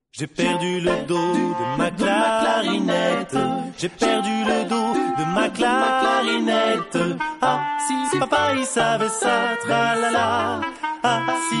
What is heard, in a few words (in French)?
Jeunes Tambours